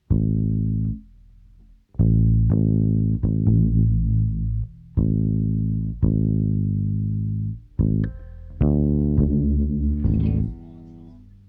Strings

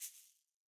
shaker single hit; Slate; SEISMIC Drum Sample Pack

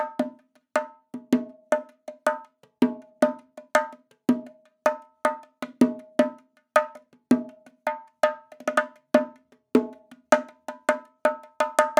afrobongo loop 80; perc construction loops; bongos afro; SEISMIC Drum Sample Pack; Slate

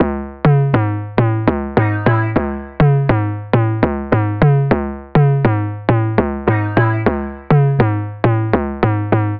songstarter 102 C#m, Pop; Slate; SD PASADENA Pop Sample Pack

